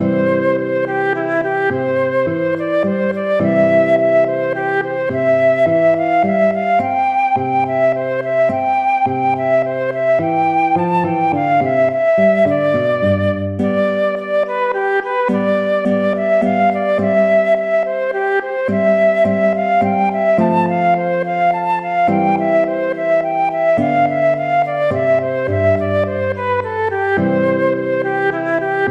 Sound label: minuetto; hook james